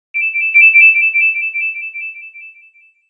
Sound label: Peal